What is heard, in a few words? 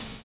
HI RIDER; Spectrasonics; Metamorphosis